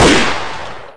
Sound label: smg fire distance fade